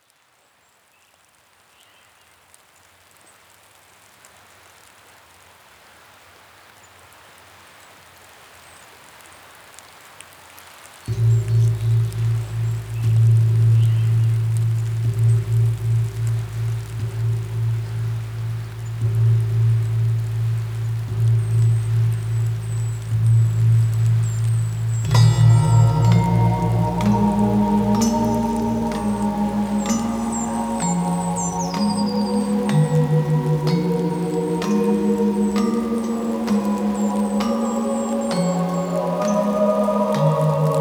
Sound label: Rain Drop Sounds; Texhnolyze